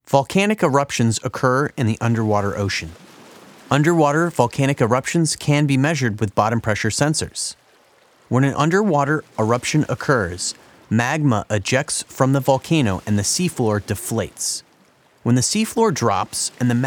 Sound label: Axial Seamount Eruption Audio Display Track w Ocean Bed